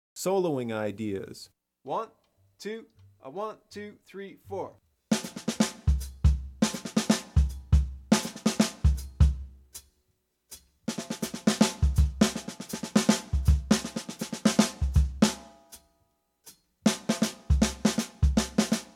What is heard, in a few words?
One bar phrases, Bop Drumming; The Art Of Bop Drumming; Riley